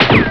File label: Ricochet